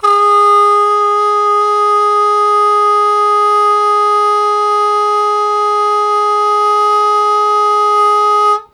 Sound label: Loud Attack Sustain Ab1, Harmonica; Zero G - Harmonica